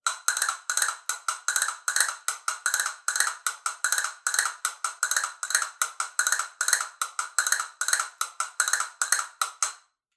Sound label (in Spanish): tela, castañuelas